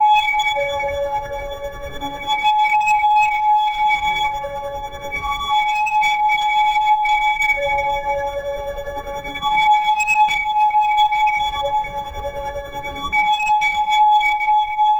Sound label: playful synth jingle, bloops